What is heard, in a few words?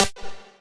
lead